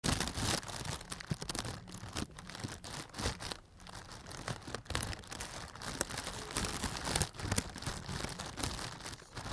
feuerknistern

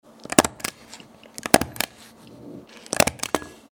tacker

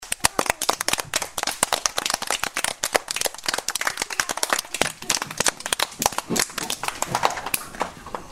klatschen

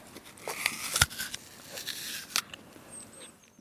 apfel beissen

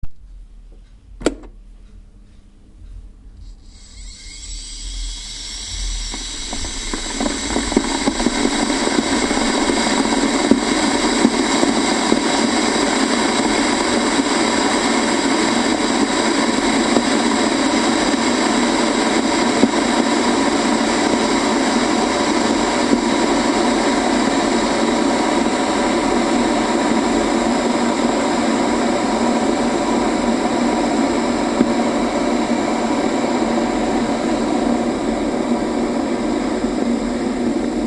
wasserkocher

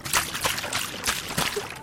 flasche schuetteln

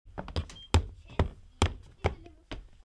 stampfen

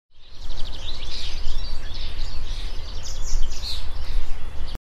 voegel im park

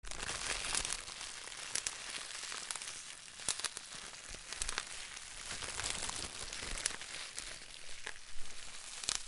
feuer

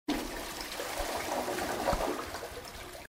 wasserlauf

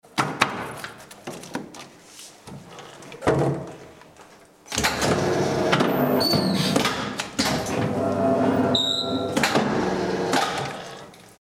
papierschneidemaschine

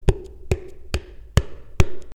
tuerklopfen

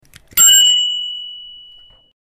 fahrradklingel

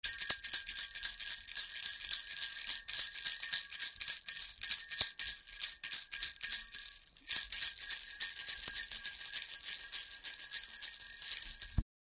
glockenrassel

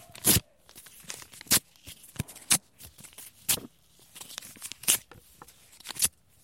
papier zerreissen

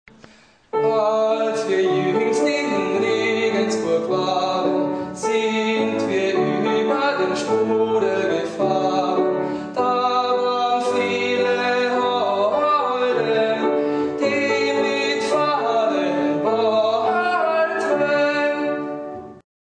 domspatz

